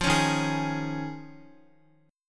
D sharp 7th Suspended 2nd Suspended 4th D sharp, Guitar; D#7sus2sus4; strummed; x,x,5,6,3,4; 4F,4A,5C,5G,5C,6E; chord shape